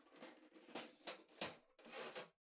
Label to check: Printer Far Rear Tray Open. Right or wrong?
Right